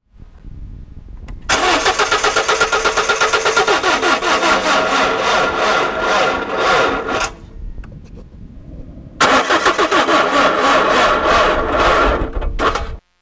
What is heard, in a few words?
discharged batt